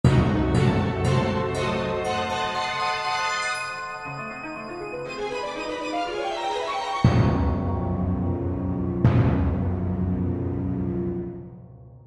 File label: Accent Orchestral, Accent Orchestral Dramatic; Crescendo Dark Low Hits; Blastwave